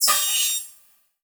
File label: Title Transition Digital Hit; Production Element Title Transition